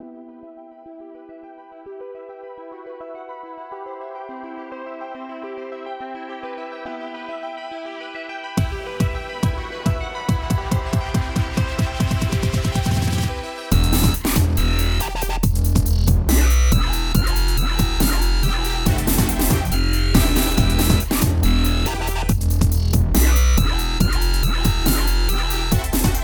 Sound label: low pass filter example